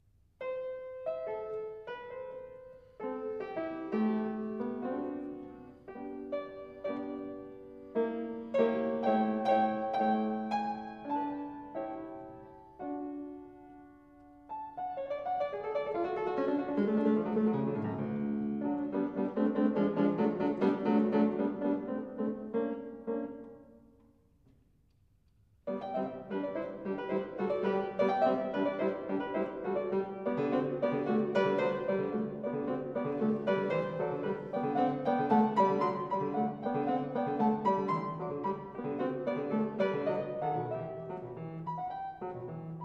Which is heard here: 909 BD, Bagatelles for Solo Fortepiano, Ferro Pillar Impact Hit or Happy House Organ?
Bagatelles for Solo Fortepiano